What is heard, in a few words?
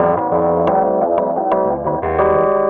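KEYS loop R, Drum & Bass 175 BPM; Ueberschall; Drum & Bass Flash Trackz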